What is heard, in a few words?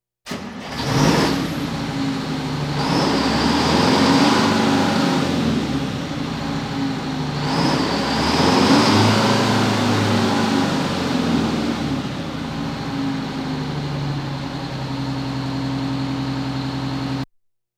Police, Fire engines